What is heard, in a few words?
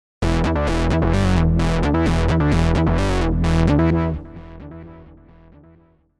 osc 3 saws